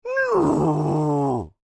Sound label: Creature Speak Moan Upset, Human; Groaning; Voice